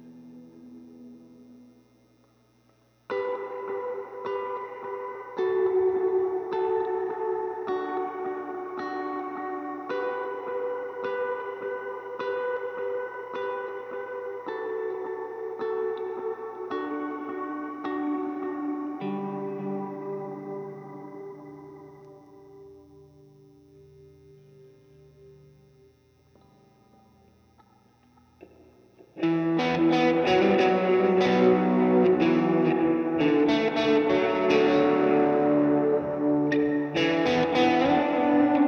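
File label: SM57 mono Guitar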